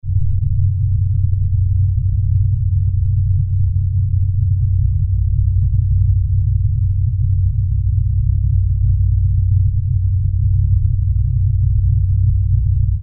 Underwater Rumble

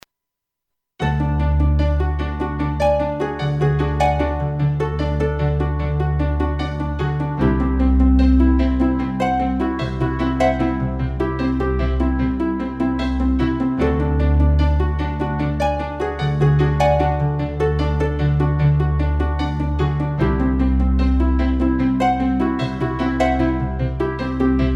Game Music Concept

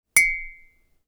UI Quirky

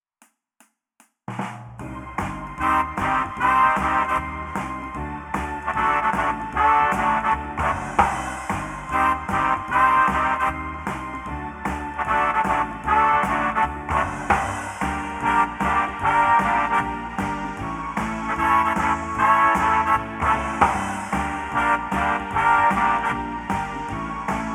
Big Band Lo Fi, Jazz / Big Band

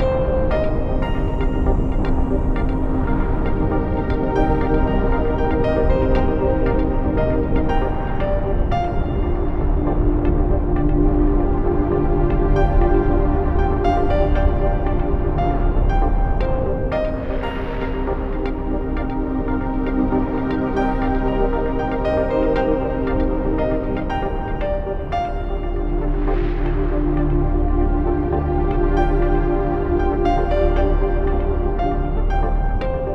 Digital Clouds Looping; fantasy

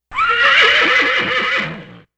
Horse Whinnying

